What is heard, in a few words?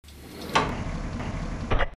door